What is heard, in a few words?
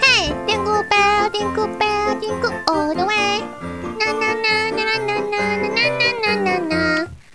jingles